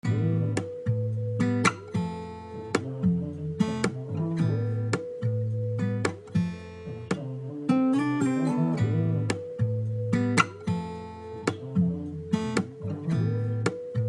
110 A minor R B

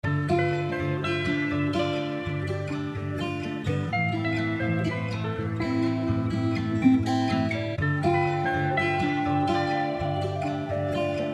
124 A minor vibey guitar

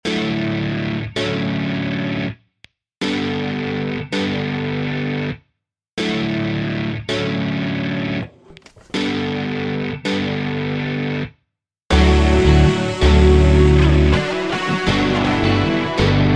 162bpm Dmaj

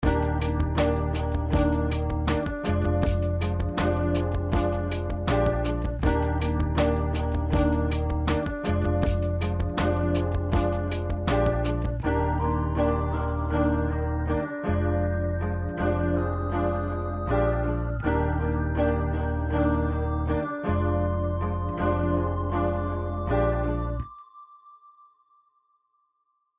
Sagittarius 80 Bb major alternative pop

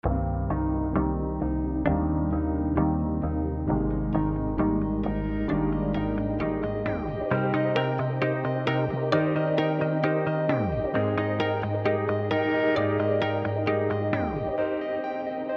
Lolla 132 Eb major melodic

